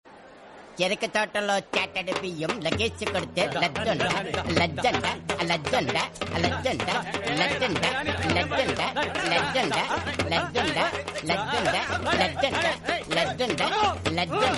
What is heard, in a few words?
tamil movie song; pandem kodi 2